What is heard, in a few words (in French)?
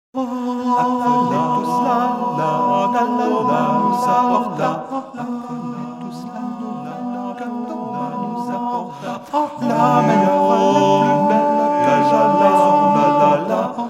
noel tenor